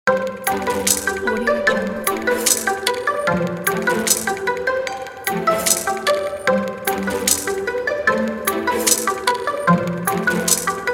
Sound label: uplifting creativity